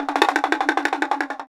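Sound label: perc hand, talking drums; Roland LCDP14 Africa VOL-2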